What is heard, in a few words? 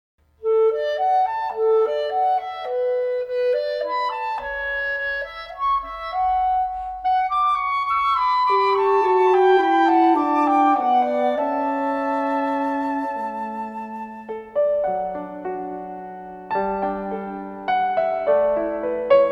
Waltz